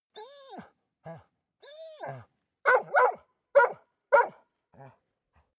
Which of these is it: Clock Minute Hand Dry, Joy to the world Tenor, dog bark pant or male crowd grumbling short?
dog bark pant